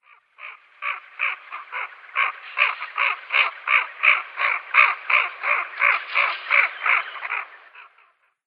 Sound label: Eurasian Wigeon female flight call